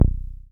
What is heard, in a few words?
SYN 303, Roland TB-303